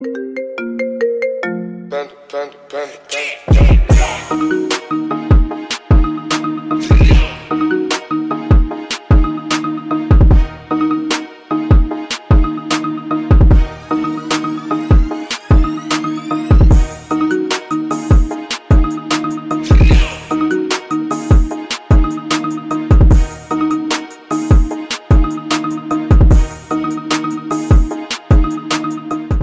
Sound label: iphone 11 remix